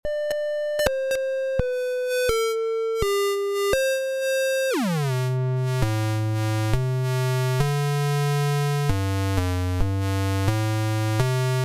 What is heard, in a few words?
low pass; micro cosm